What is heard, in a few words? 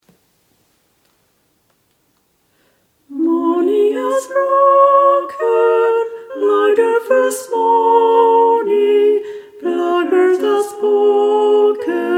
tenor; Morning has broken